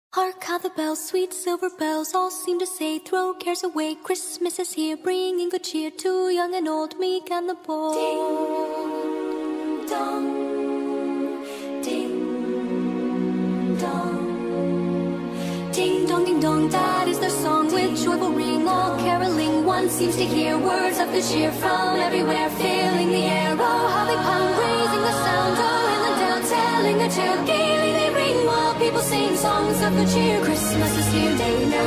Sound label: Carol of the bells Dubstep remix